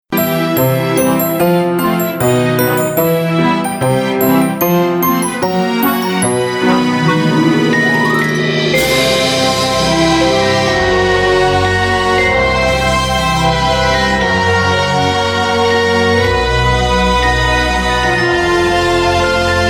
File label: wintery waltz